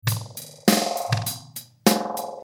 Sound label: delay